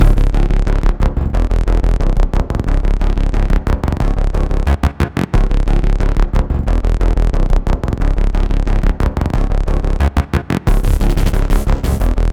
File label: Dramatic Background Theme (Looped)